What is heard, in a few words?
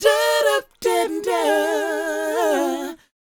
DOWOP A#, Vocal R&B DOO WOP; ILIO; Vocal Planet